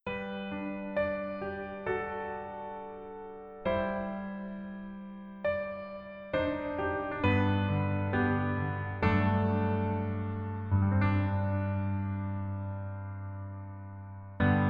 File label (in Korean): Piano ver, 멜로디MR